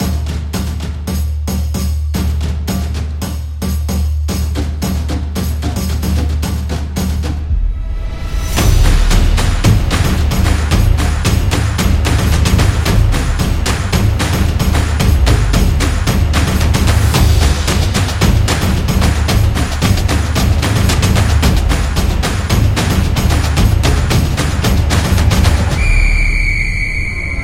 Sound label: Samba Drums Latin